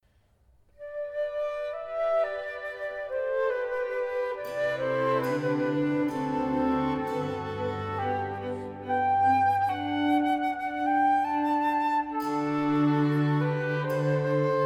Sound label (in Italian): Concerto Per Flauto Adagio; padre giuseppe paolucci